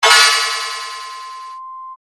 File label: delay